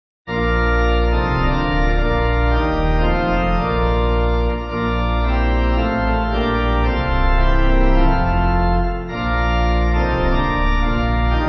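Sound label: Pipe Organ, Music Backing Files for Churches; Lord Our Lord; Thanksgiving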